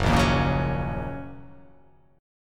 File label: Fsharp 6th down, chord